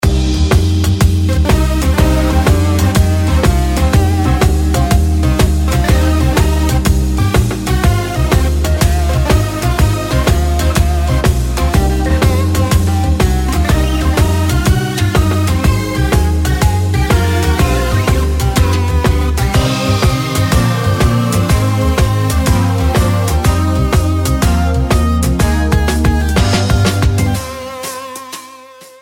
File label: deep blues; crypt of the necrodancer